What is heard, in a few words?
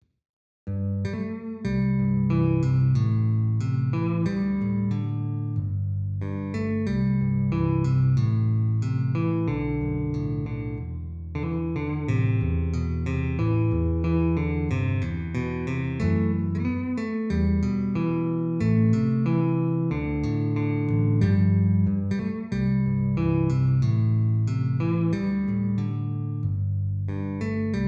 Bass Solo